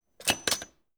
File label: telephone